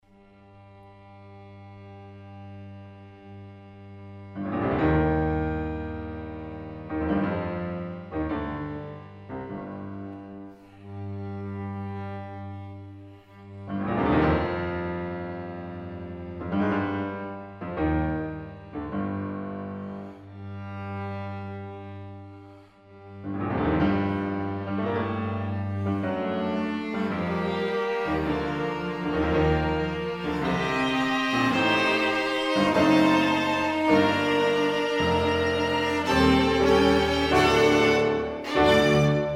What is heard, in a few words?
trio; goetz